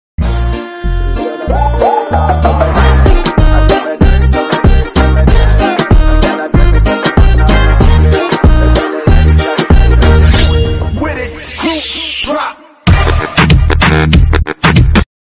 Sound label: sunglassess challenge song remix